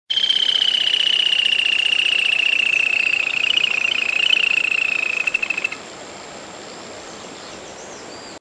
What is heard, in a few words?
Laterallus viridis; Ilha Coman